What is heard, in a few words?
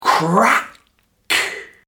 crack